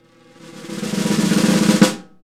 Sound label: Snare, Roland LA Composer